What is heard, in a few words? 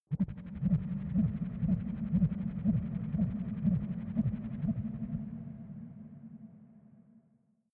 space alien spacecraft underwater drive wobble; soundpool